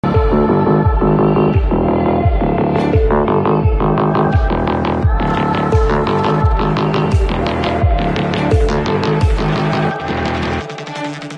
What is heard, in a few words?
bgm cinematic coorg style slowmotion walk action music; bheeshmaparvam; mandalpatti